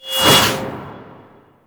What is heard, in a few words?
Royal Guard Jumping Smash Pre Hit; Warframe; Grineer; Abilities